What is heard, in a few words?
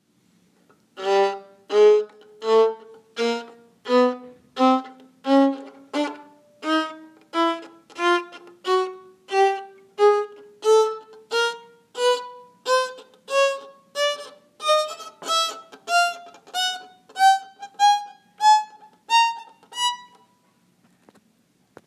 violin chromatic staccato